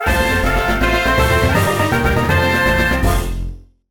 evolution fanfare; pokerogue

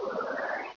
Magma Storm; PokeRogue; battle anims

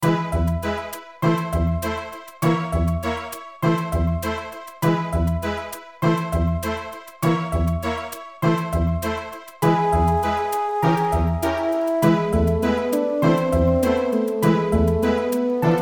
tall grass; PokeRogue

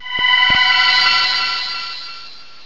Oblivion Wing; battle anims; PokeRogue

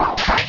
Tail Slap; PokeRogue; battle anims

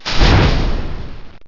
Wild Charge; battle anims; PokeRogue

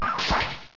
Vine Whip; PokeRogue; battle anims

